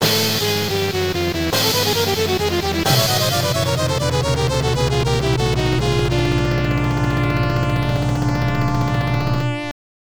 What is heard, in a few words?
Last Round Clear; Liquid Kids; arcade